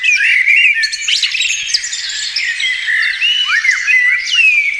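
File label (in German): Vögel; Breakneck; Austral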